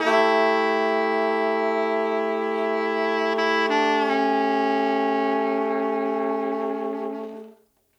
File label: Horns, 70s Analog Funk; Sonic Foundry (Sony Creative Software); What it Is!